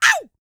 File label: YELL, MALE HUMAN; Spectrasonics Vocal Planet